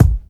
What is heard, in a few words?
clear bass drum sample A key; kick drum samples